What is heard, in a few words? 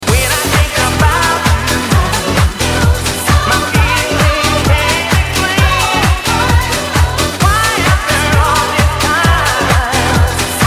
groove